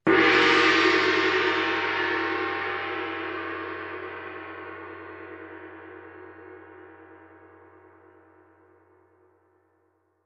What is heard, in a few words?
Small gong